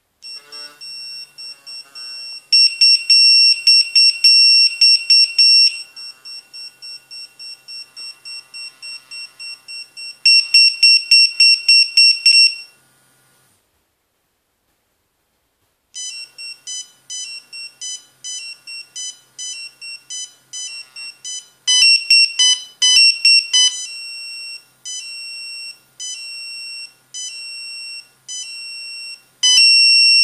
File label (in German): Swissphone Boss 915 Melodien